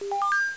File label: Alarm; Newton